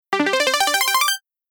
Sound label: Delay Before